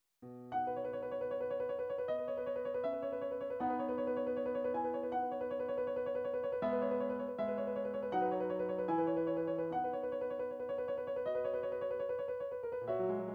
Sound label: studies for piano, midi